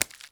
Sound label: fruit impact; physics